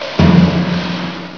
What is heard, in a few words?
THUMP; sound effect; Klappen en Vallen